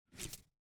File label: archery firing loose bow mythic midflight wide hum sizzle energy; Eiravaein Works; Nocked; Pinaka bow